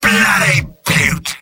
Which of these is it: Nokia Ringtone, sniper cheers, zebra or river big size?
sniper cheers